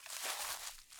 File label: Rustly Step, Footsteps, outdoor